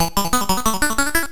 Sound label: electronic bell